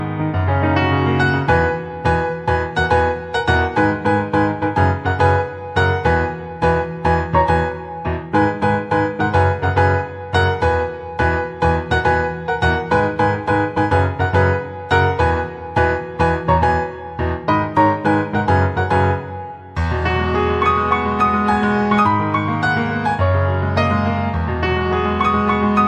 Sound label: piano